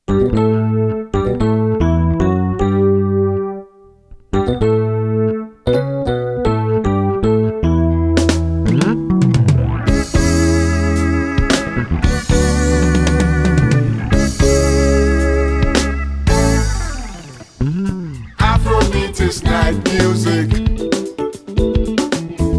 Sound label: Afro Beat Night Music